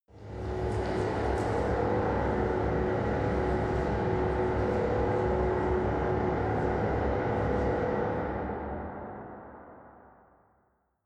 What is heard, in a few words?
machine room wet